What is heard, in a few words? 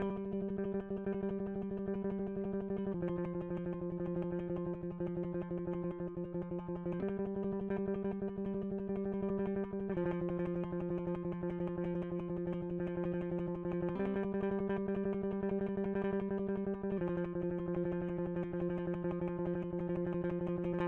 electric